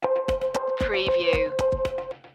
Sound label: climb a mountain stinger; challenge